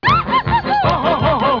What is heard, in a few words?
ha ha ho ho; "Mystery Sound Bite" Contest